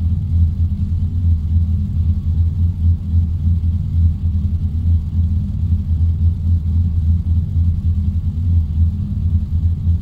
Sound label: idle, vehicles cars; lwcars; tvr sagaris